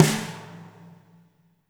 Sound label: VRB SNR; SNARES; Spectrasonics - Burning Grooves CD2